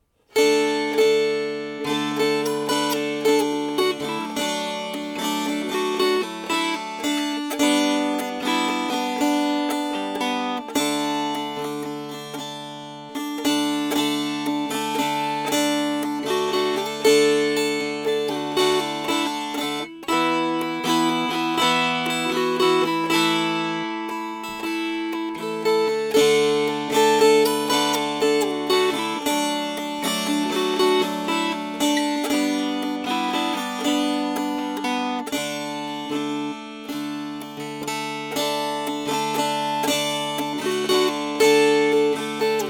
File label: Strummed; Knoxville Girl